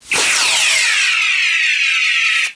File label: phaser type 3; federation